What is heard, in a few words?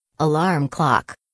alarm clock; bedroom